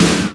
SD HOU, Dance Mega Drums; Best Service

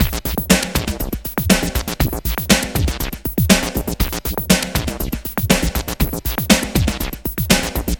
Elektro, Processed Percussion

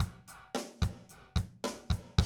drum loop, 100 BPM; Best Service; XXL 1500 DrumLoops; Sample CDs

